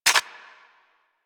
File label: Shotgun Spin Fire; DE; Warframe; Weapons; Skins; Zoboz Shotgun Skin